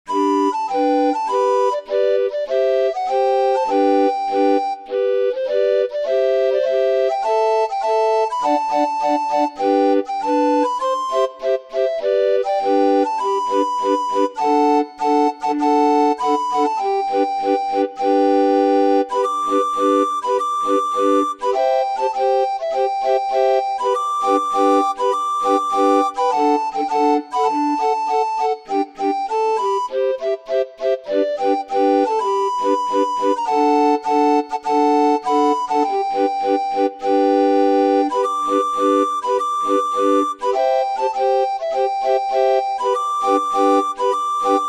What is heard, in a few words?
Marching through georgia, orgue barbarie